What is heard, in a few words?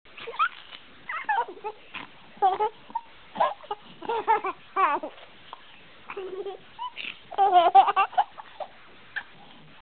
Giggles